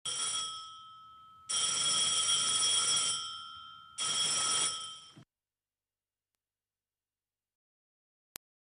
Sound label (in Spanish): timbre